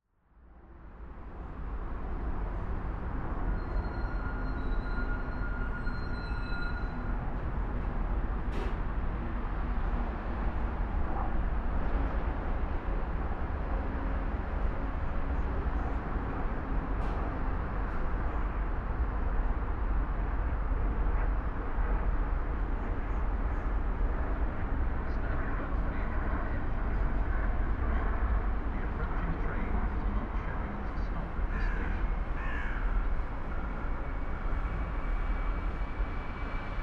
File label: Queenstown Road station